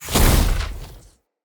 Casting Device Impact; Weapon Impacts; Casting Devices; Fenn

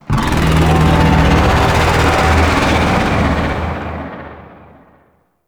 Void Dragon Vocal Idle; Enemies; Vocals

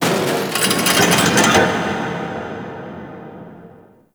Turret Moves; Warframe; Grineer; Fortress; Ambience

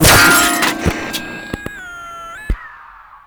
Screen Blast; Ambience; Props; Warframe; Nineteen Ninety Nine

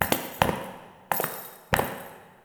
Idle Backward Steps; Tagfer; Warframe; Ambience; Entrati

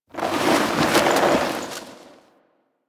Idle Screen Foley Slow; Warframe; Corpus; Corpus Lich

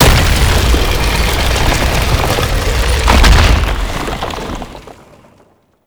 Exit Door; Duviri Murmur; Oraxia

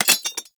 Rifle Mag Drop; Warframe; Weapons; Thanotech

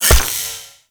Rain Of Arrows Impact; Warframe; Duviri; Dax Archer; Abilities

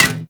Melee Staff Impact Generic; Warframe; Staffs

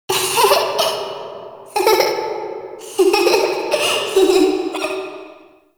Recorded Children Misc Amb; Ambience; Dungeon Island; Duviri

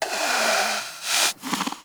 predator snore

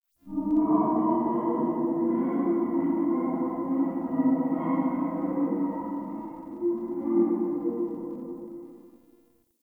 Exterior Distant Calls Scared; Ambience; Warframe; Duviri

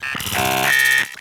Amalgam Moa Vocal Idle

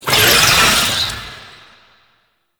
Wall Climb Impact Vocal; Warframe; Oraxia

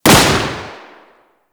Pistol Shot; Warframe; Sapient

